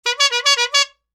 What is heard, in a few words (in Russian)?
gudok klouna